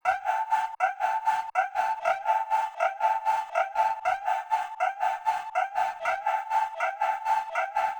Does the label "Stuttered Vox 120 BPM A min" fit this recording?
Yes